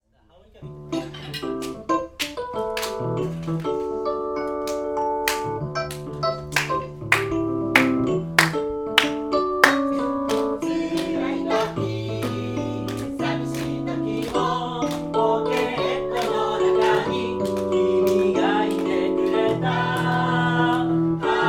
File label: harmonica